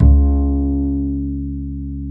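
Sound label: double bass C#2; Univers Sons; Jazzistic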